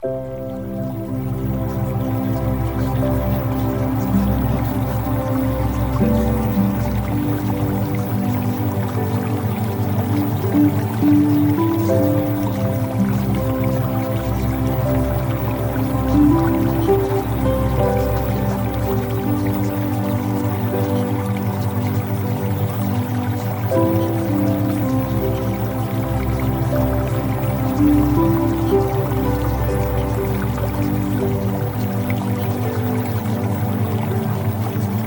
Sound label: Music Theta Waves; Premium Subliminals